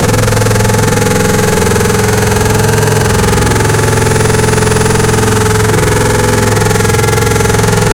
formula 1 v8 engine

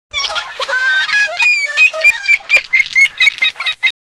parrot and squirrels joyf

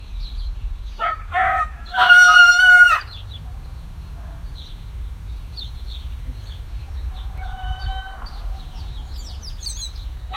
ai chicken garden, farm

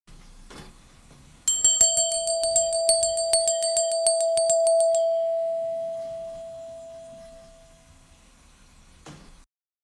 the sound of a doorbell, household

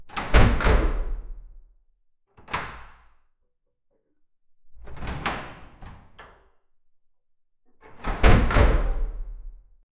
several heavy doors shut

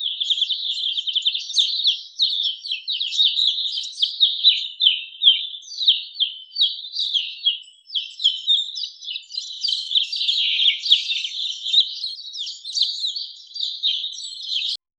birdsong with bats

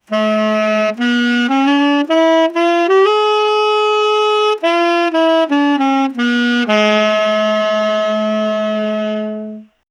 tenor saxophone

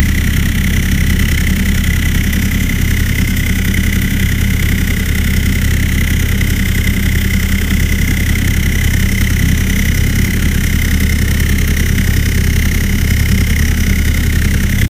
the sound of an idling, engine

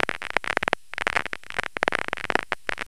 a cracking instrument, string instrument

